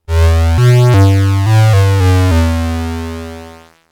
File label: 1972 Odyssey VCO2